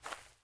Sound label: Impact Light